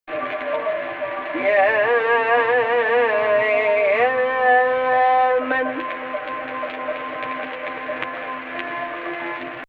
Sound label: AHH, Egypt Rast